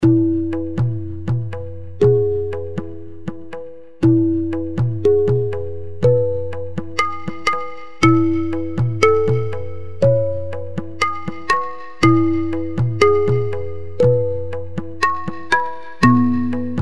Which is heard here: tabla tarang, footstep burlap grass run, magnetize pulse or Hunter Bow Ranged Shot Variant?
tabla tarang